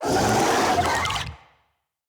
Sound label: Infested Big Ashen Vocal Attack; Enemies